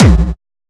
Kick

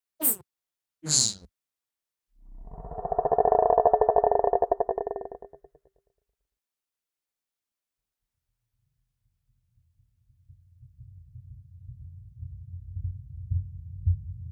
Rasp Slap Heart

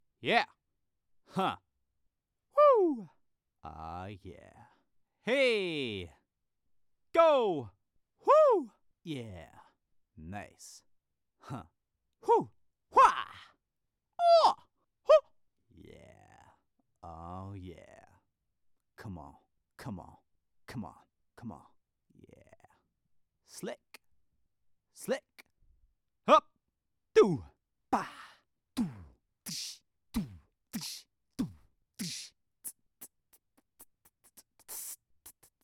Short Male Shouts Vocals Beatbox

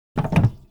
Toilet Flushing Button

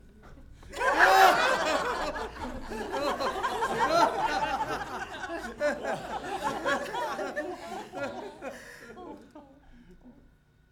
Laughing Audience Laughtrack

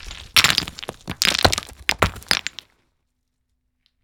Rocks Dropped Tumble

